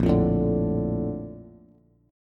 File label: Bb Major 7th, Bb, down, Bass; BbM7 strummed; JGuitar